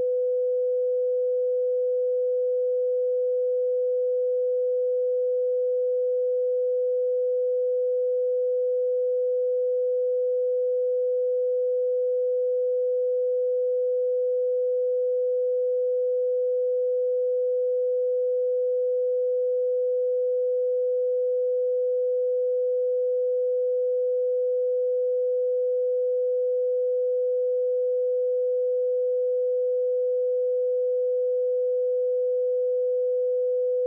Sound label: 500 Hz Sinus 20db; IPmotion Test Drive